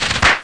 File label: Hit-Lose; Professor Fernleaf from the Tropics